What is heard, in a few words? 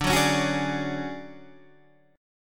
D Major 7th Flat 5th D down Guitar DADGAD